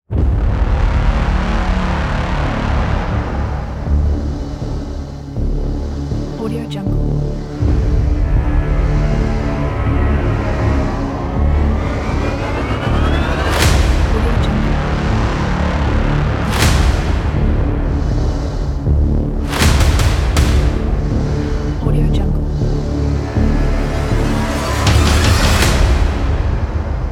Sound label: horror; synthezx